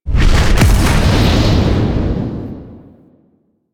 Spawn; Warframe; Veilbreaker; Sprag

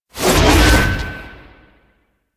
Exalted Whip Cast; Warframe; Warframes; Khora

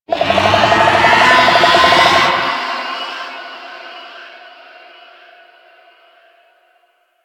Infested Golem Vocal Charge; Warframe

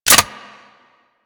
Reload Dual Pistols; Grn Queen Guard Dual Pistols

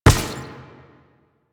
Step Default

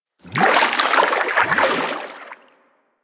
Fishing Spear Fish Spook; Warframe; Weapons; Eidolon Fishing Spears

